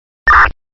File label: police radio beep